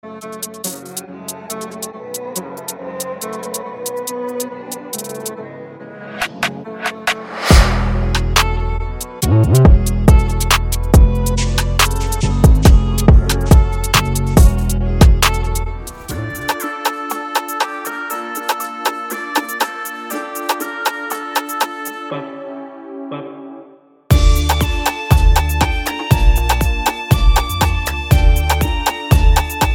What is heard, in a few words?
Beats